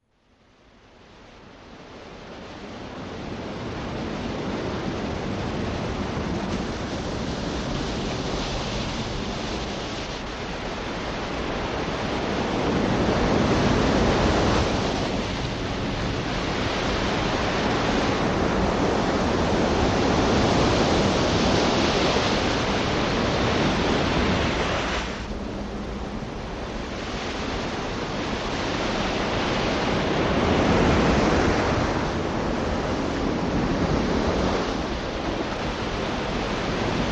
Psychologically Ultimate Seashore Environments Reversed; Pitch Shifted; Irv Teibel Syntonic Research